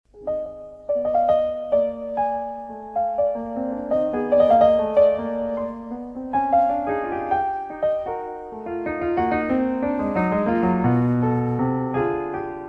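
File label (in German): Klavierstücke Allegretto poco agitato e rubato; Heinrich Schenker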